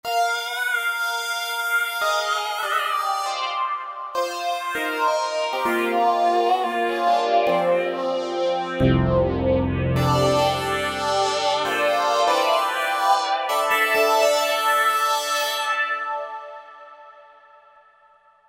Thick Sine, Roland V-Synth; Factory Patches

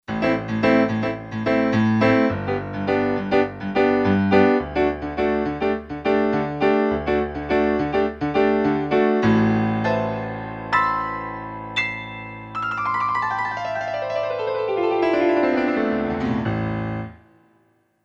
Brite Grand, Kurzweil K2500X; Factory Programs

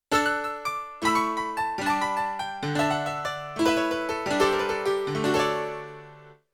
Banjo & A Piano; Yamaha TX16W; Jazz Performance; Jazz Performance 2

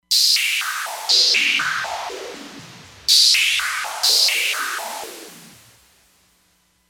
Lazer Points

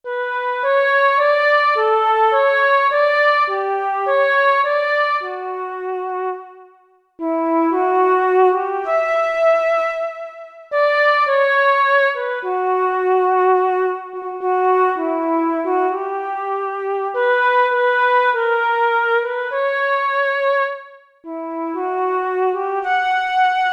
Wide Flute; Yamaha TX16W

